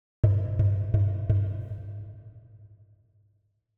Drums Hits - Four Lower Hits; Hollywood Edge; sound designer's toolkit v2

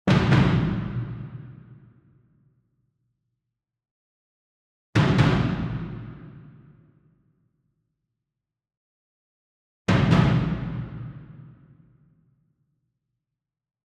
ENSEMBLE DRUM Bass Drum Marching Double Far; boom library; Cinematic Strikes Construction Kit

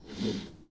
Foosball Forward Slide

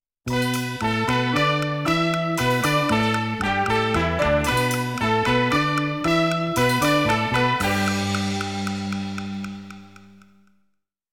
Oriental, jingles

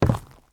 zombie footstep wood; DeadFright

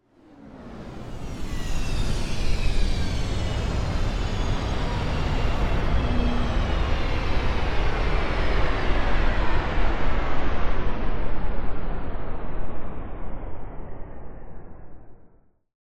Space Ship By - Turn Off The Engine; Hollywood Edge; sound designer's toolkit v2

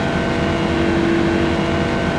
Machine Generator Loop; Machinery - Generators

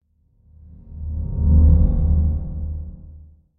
Drone Pad Mystical Enter; Hollywood Edge; sound designer's toolkit v2

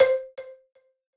PUZZLE Success Xylophone One Note Delay; stereo; Universal Sound FX

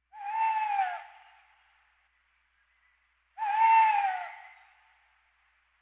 Birds

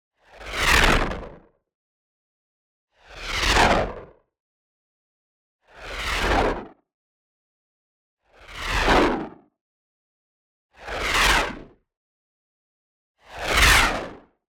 WHOOSH Noise Gritty Distorted; boom library; Anime Essentials

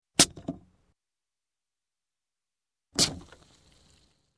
Bottle, Beer Open, twist Cap; Sound Ideas 2000 Series

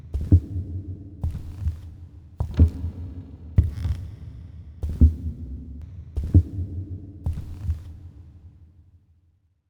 Footsteps Wood Reverb; Inquisitor 2

